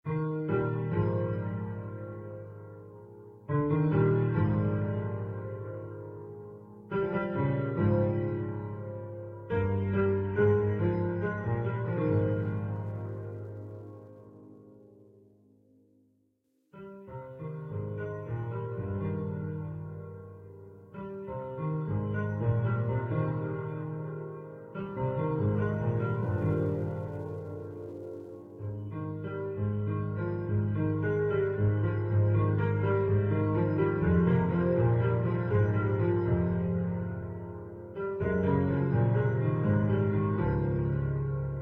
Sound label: Ambient Music; Lethal Company; Windows